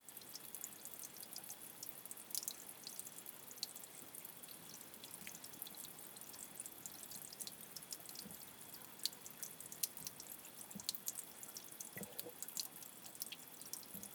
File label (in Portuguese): Nascente pequena de Agua muito suave na Chapada dos Veadeiros